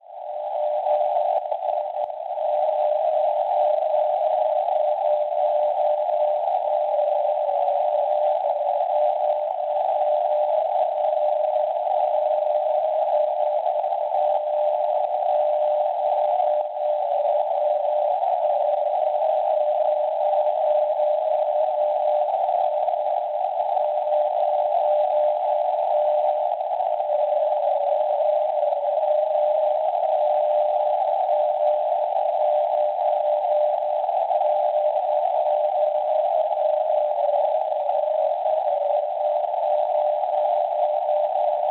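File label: non-directional beacon 323 kHz; GT; Amburgo Nord; Schwarzsee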